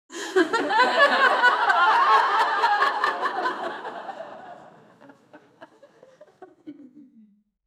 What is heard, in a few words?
realistic live audience, theater